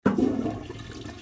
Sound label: toilet flush